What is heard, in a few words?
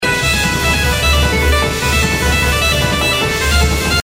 anticipation loop; Highland Reels